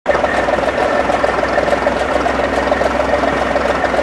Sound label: Idle Inaction